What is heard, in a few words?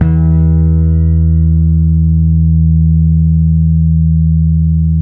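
8 finger bass F 3; Spectrasonics; Bass Legends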